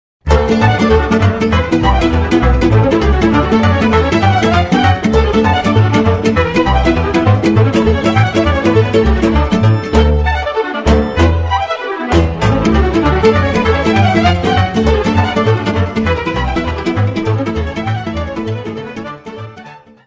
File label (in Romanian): Sirba de concert; Roumanie